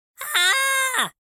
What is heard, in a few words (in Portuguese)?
Minion Grito